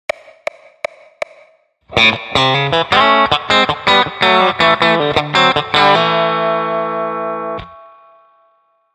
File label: chicken pickin